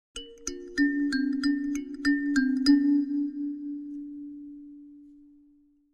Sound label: Kalimba Exotic Melod, mallets kalimba